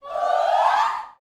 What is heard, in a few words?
SHOUTS, Gospel; Spectrasonics; Vocal Planet Vol.1 Gospel; akai; UYC SHOUTS